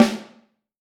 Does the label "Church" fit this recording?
No